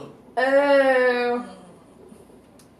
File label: ohhhhh